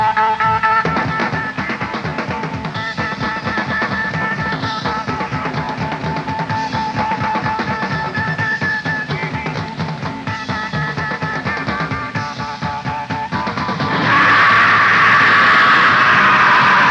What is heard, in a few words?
Drum Solo; San Francisco, CA; December 13, 1971